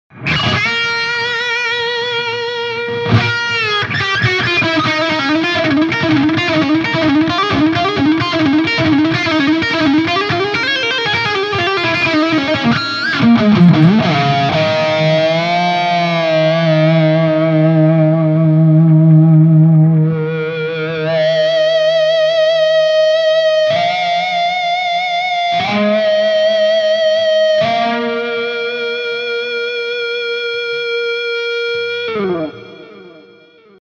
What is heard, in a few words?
lp lead solo